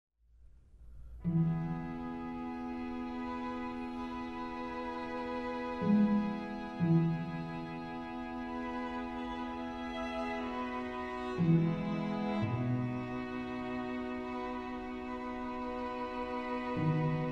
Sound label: String Quintet; Schubert